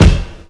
hit, percussion hit; Ueberschall; Techno Trance Essentials; DRUMS

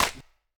Clap, Akai MPC-5000; Internal Rom 2

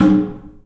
DRUM; The Piano; InVision Interactive

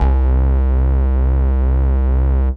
Synth Prophet Bass C; Electronisounds

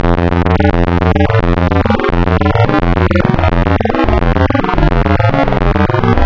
Spectral, wavetables; Architecture 32

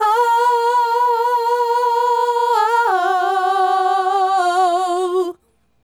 Female Group, Hip-Hop and RnB Vocals; Sonic Foundry (Sony Creative Software)

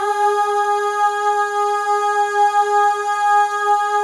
L AHS, Symphony of Voices, FEM; Spectrasonics; FEM.1 AHS

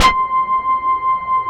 Korg POLY800 C4; Back In Time Records; Korg Universe VOL-1

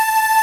Ending loop

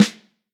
Vintage Snare Drum, 5.5X14; Q Up Arts; Johnny.C's Vintage Snare Drum

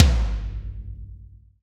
TOM TOM, Roland

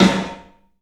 PA DEEP R, CLUB SN VRB; 3D Sound; 3D Drums

